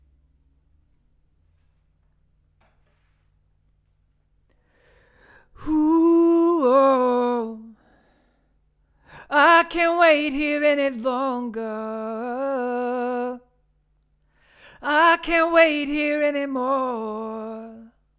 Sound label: Vocal